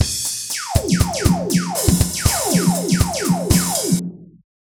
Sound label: drum snare